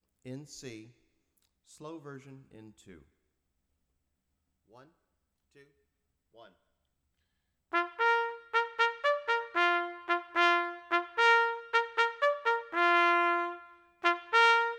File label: swimming, bugle